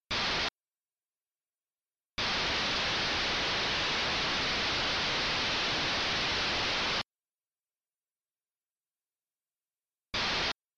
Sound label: PACKET 9600